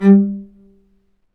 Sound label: STR, Vc Marcato; Roland L-CD702; Vc Marc&Harm; Vc Marcato 1